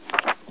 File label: paper; Dino Crisis; room